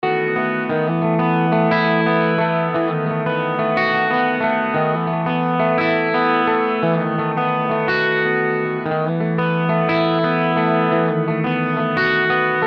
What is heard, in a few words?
Normal clean; GR5 Presets – Normal